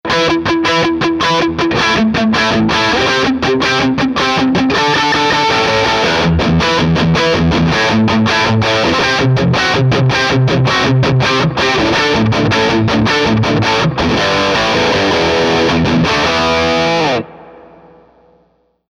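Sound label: Rockerverb RK50MKII212 Dirty Gibson SG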